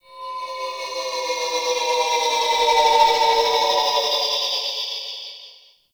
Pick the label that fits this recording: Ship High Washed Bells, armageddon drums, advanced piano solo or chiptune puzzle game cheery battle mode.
Ship High Washed Bells